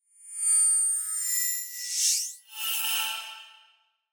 Electrical Feedback Front To Rear To Front; Electrical Feedback Whoosh Rear To Front

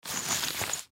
Plastic Bag Grab, Plastic Bag Grab Drag Carpet; Dragging; Foley; Sound Effects:Objects; SoundEffects-Blastwave

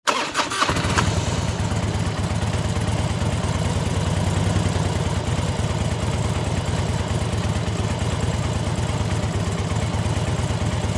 Motorcycle Start On Idle Off; Transportation Automobile

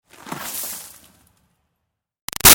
Snow Dust Sweep Fast; Weather; Big Room Sound

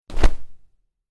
Cloth Character Movement Foley Whoosh; Miscellaneous Human Sound Effects; LynneMusic

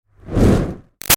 Fire Torch Whoosh Fast Strong; Flame Sound Effects; Objects; Big Room Sound

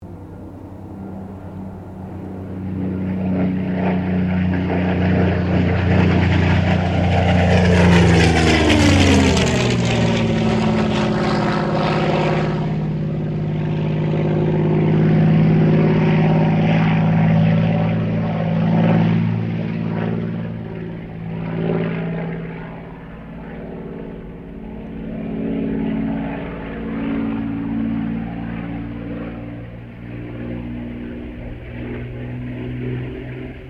AIRCRAFT SUPERMARINE SPITFIRE MK-VIII LOOP STEREO; Airplanes

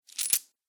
Coins Drop; Money

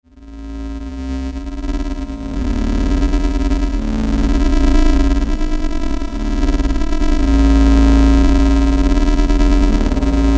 Digital Swell Distortion Stutter; glitches; Blastwave

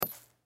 Bullet Shell; 9mm Drop Sand; Blastwave; Warfare; Drops (Sound Effects:Objects)

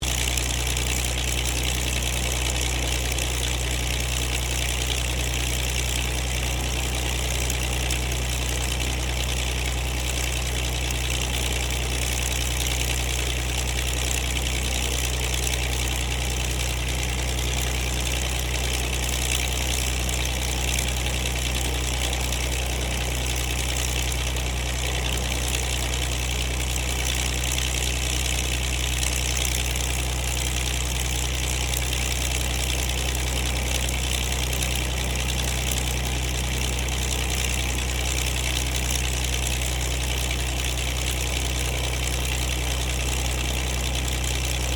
AIRCRAFT P-40 KITTYHAWK IDLE; P-40 Kittyhawk / Warhawk / Tomahawk; Airplanes; Vehicles

